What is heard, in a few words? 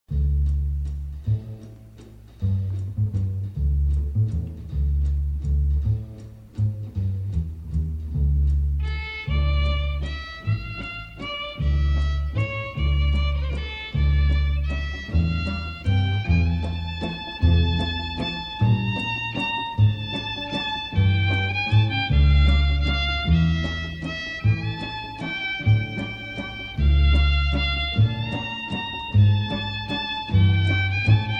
Carpathia Waltz